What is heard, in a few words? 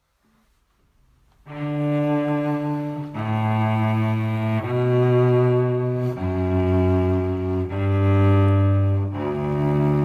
canon in d duo; pachelbel